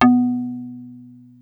BASS Hi; Africa bass

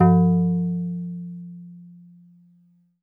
Steel Drums DX100 F2

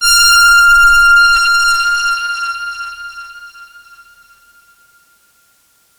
big brass F5

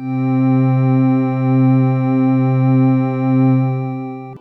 PAD Europa pad

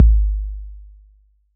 BD 808 Decay

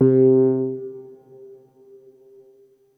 soft tines C2

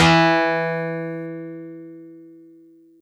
Easy Clav DX100 E3

